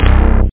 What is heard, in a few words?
Bass Sin